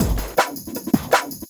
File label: Loop, Drum & Bass, 160 BPM; Ueberschall; Drum & Bass Flash Trackz